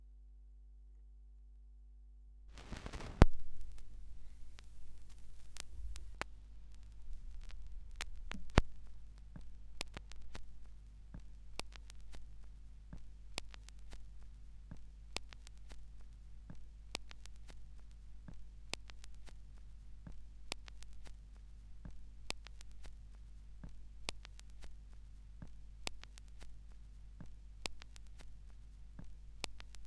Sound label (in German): schallplattengeraeusche; rauschpartikel